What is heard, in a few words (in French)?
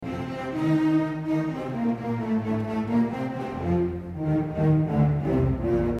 recitatif vc cb; nouvelle « question » en récitatif; Beethoven